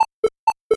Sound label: 8bit se